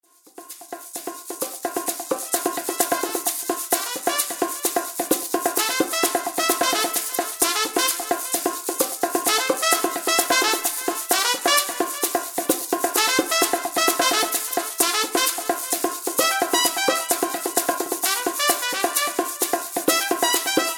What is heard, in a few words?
Samba